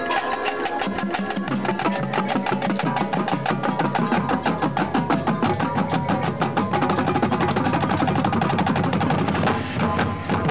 rumba, drums

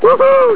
Woohoo

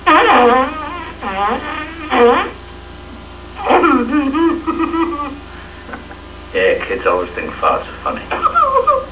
Farts; ....Yeah, kids always think farts are funny....; Hearts In Atlantis

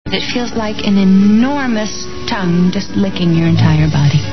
tongue

